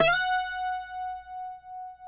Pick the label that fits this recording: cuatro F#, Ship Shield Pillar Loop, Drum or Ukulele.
cuatro F#